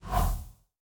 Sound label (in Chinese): 旋风人落地声